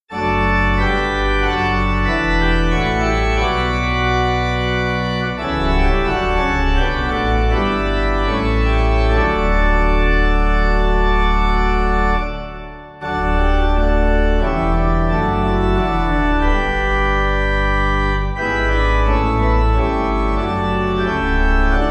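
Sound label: organ 2 verses was frag ich nach der welt; Hymnal 1982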